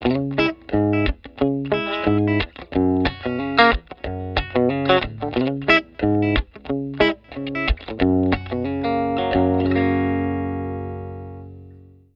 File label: STRAT BRIDGE LICK, S pickup set